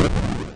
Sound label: EXPLODE; Diamond Hollow 2